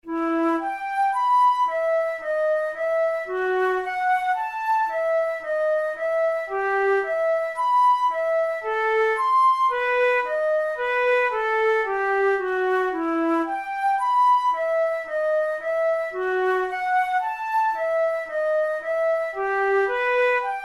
largo, flute; braun; pieces sans basse no16

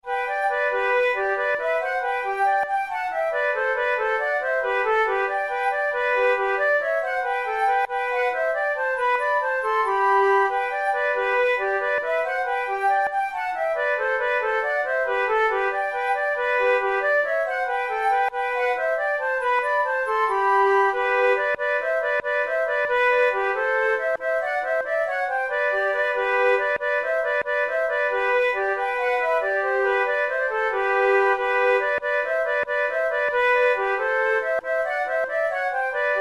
quick step duet, flute; steam boat

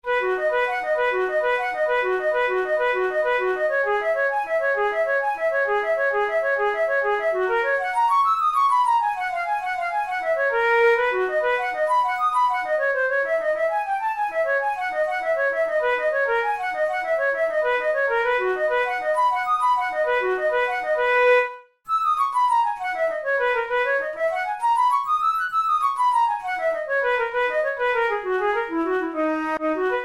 methode pour la flute study; drouet

